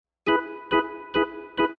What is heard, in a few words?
Ska Organ Comp E; Lounge & Reggae; Loopology; Ska Till Ya Drah